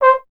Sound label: Trombone C 0; Miroslav Vitous - Solo Instruments VOL-1